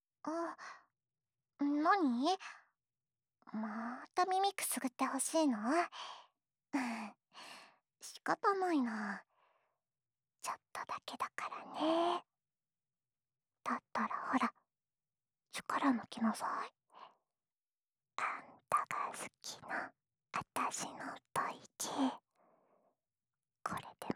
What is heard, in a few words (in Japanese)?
安眠用お耳ふーふーループ（右耳）